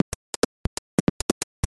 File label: Drums